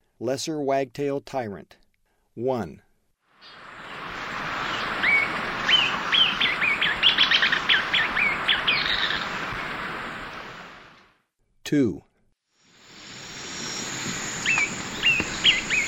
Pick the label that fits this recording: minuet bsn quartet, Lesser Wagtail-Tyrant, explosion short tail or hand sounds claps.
Lesser Wagtail-Tyrant